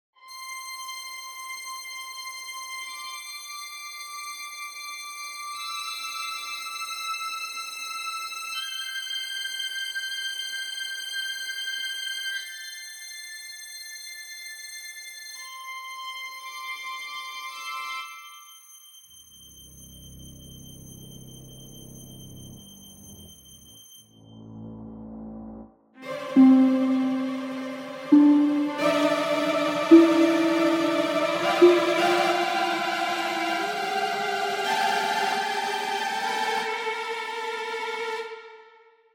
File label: pentatonic scale